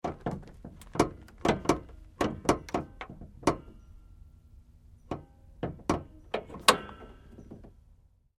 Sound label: Truck GMC Savana Hood Moves; 2010 GMC Savana; Vehicles; Trucks